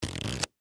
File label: Game Cards Flip, Sort, Board, Property, Cards, Large; Sports; Monopoly; Board Game Sound Effects